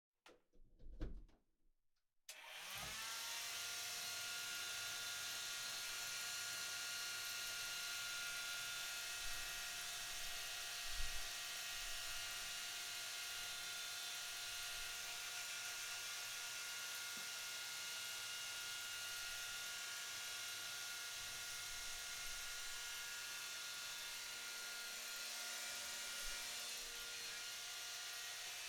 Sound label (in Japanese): ドライヤーをあてる; 3DIO; お風呂に関する音